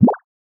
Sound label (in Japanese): ぴょこ